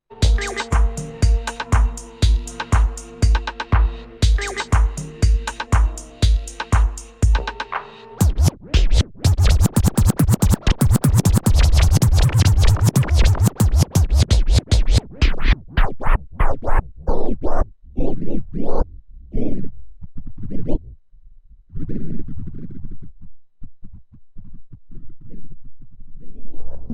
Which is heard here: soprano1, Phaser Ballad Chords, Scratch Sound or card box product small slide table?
Scratch Sound